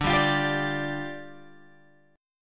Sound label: D Minor Double Flat 5th, down, Guitar, Open G